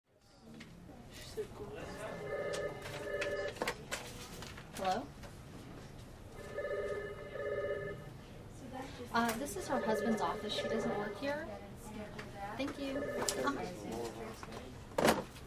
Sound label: Dentist Office: Reception Area Walla, With Phones And Paper Movement.